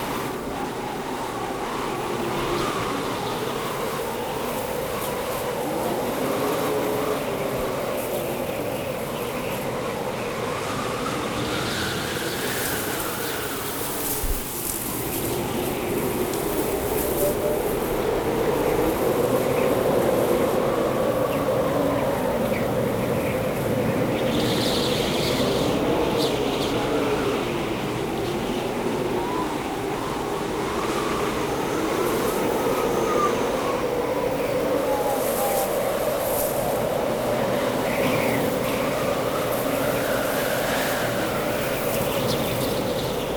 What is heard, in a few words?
Ambient; Stage6; Terra Feminarum